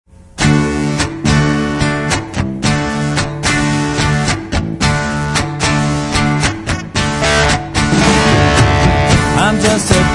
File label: Post Industrial Landscape Blues